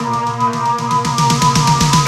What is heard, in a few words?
Drum Intro